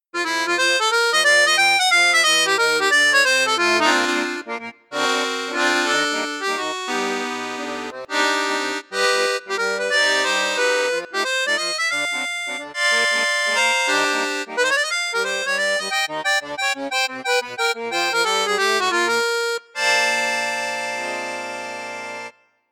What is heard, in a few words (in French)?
registre flute juste, excelsior accordeon